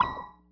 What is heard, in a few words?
ui accuracy confirm